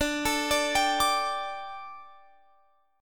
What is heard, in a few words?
D Suspended 4th D, Guitar, Open F; Dsus4; arpeggiated; x,21,21,21,22,21